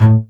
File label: BASS MART A2; AKAI S-Series CD-ROM Sound Library VOL-2